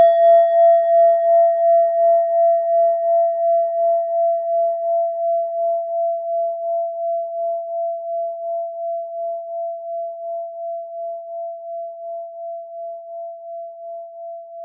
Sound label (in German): klangschale nepal, Klangschalen Nepal